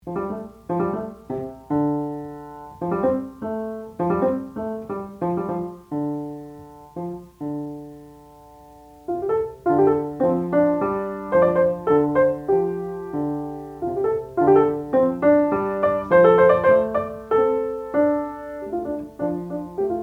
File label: piano